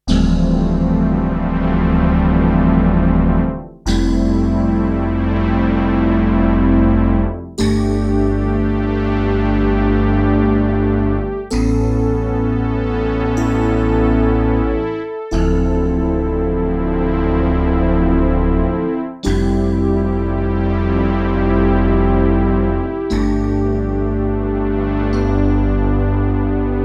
Pad dry

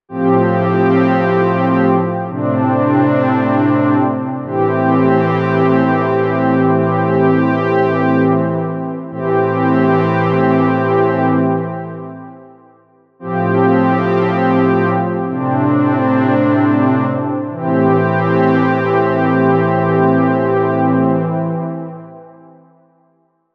Warm Pad 4 x Oversampling; Cherry Audio Mercury-4

